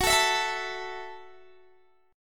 Gb Minor 7th Gb, Guitar Open D

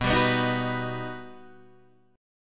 G Augmented Major 7th

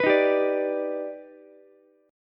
Eb Augmented Major 7th Eb down Ukulele; Eb+M7 strummed; JGuitar

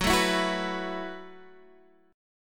Gb Minor 11th Gb down Guitar Half Step Down; Gbm11

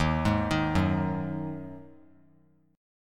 D sharp Minor Double Flat 5th; arpeggiated; chord; 15,15,18,16

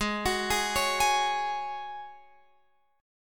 Ab 7th Suspended 4th Ab Guitar Open F; Ab7sus4 arpeggiated; x,15,18,15,16,15

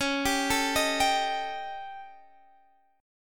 D sharp 7th Flat 5th C sharp

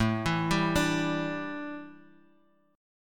A 7th Suspended 4th, A, down

